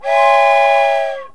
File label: train Whistle; whistles Horns Bells